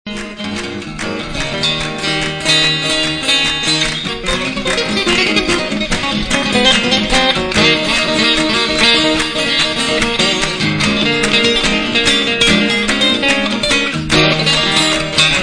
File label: vail guitar